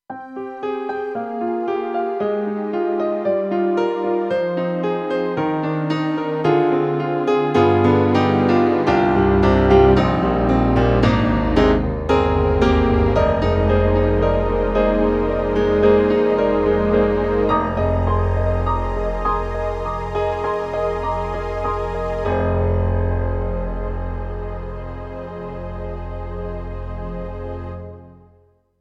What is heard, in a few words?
KORG M3 XPanded Real Piano and Strings